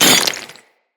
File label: Melee Impact Cement